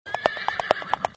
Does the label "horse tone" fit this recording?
Yes